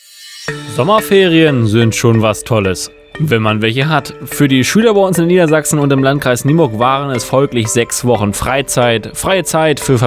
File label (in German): Didgeridoo